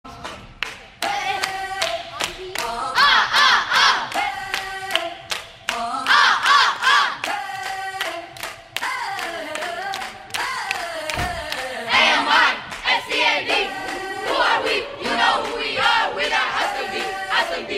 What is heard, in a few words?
amistad cheer